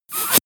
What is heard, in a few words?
air pressure release